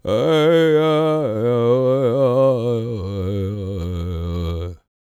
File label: World Voices, CHOCTAW